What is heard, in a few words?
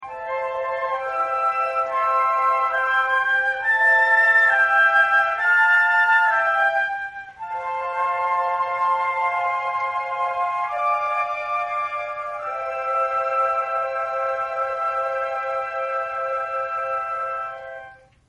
concert, flute; Newyear concert 2017